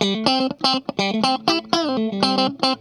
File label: STRUT, Funk Guitar; 90 s Sample CDs; Zero G